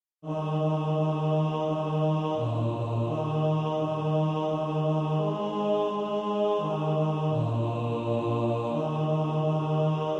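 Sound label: bass, practice music, SATB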